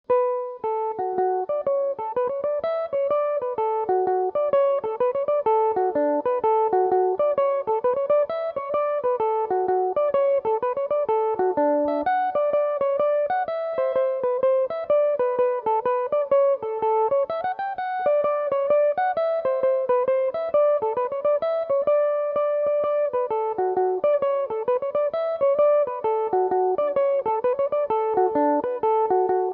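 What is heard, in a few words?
top it off slip jig D major, irish mandolin; Jig (9/8); Belmuse EM-190 electric mandolin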